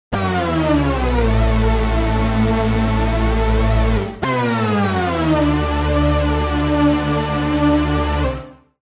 Oscillator LEAD Hoover Juno Sway; Alphatron; Syntheway

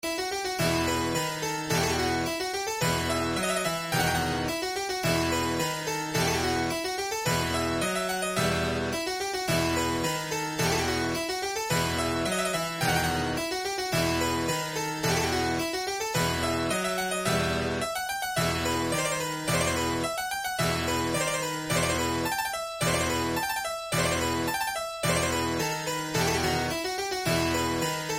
Suite in E minor Tambourin, Harpsichord; Pieces de Clavecin; Jean-Philippe Rameau; RetroMagix Harpsichord

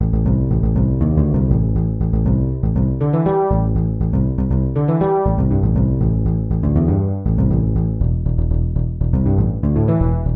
BASS Dynamic Bass; Synthelium; syntheway

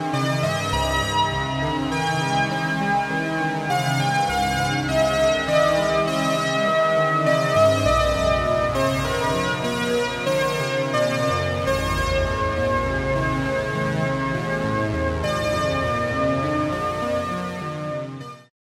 Oscillator KEY Glowing Amplitude; Alphatron; Syntheway

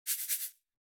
shake

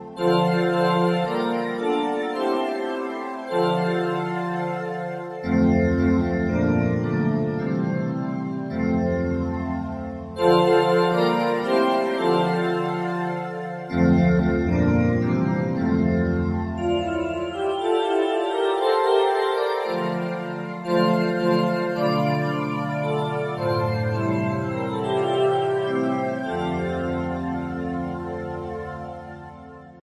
Glassy Ambient Organ; Organux; Syntheway

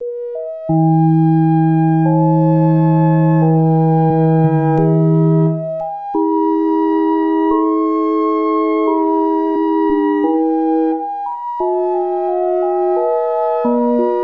KEY Pulse Organ Hue; Phasewaver; syntheway

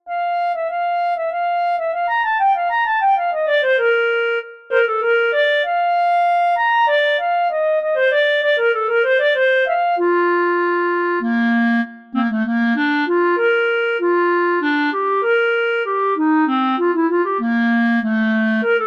Clarinet; DAL Flute; Woodwinds

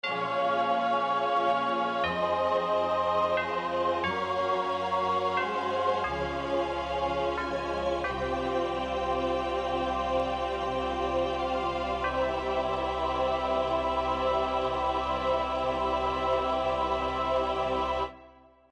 Choral; Syntheway

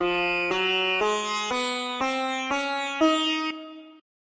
F Mela Bhavapriya India Scale, Virtual Sitar; Syntheway

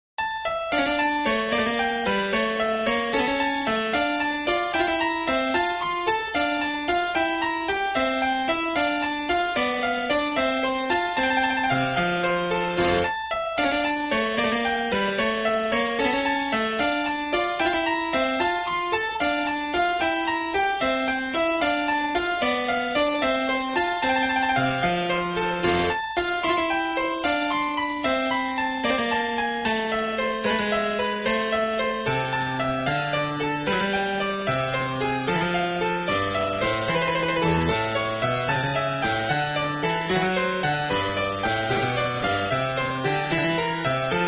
Harpsichord; Les papillons; Premier livre de pieces de clavecin; Francois Couperin; RetroMagix Harpsichord

